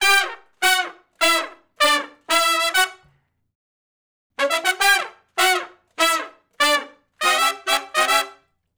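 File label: Funk Riff (A) unison, harmony, Horncraft for R&B Sections; Sony Creative Software; Crimson Blue and Fabulous Horncraft 4 RnB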